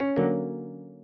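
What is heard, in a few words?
Instant Messaging Cant Connect; oxygen sounds; plasma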